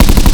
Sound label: Auto tower Attack